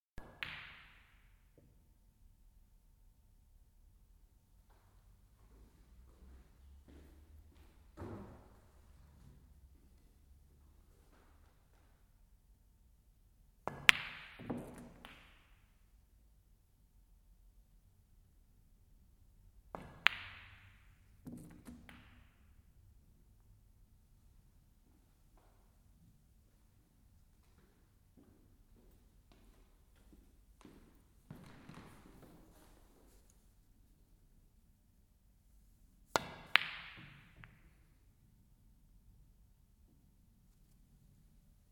Snooker, Snooker, amateur players, chalk used, rest used; chalk used at 2m27s, rest used at 4m26s; Miscellaneous Sport; BBC